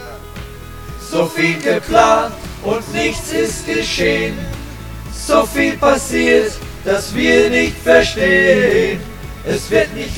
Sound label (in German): Refrain 3stimmig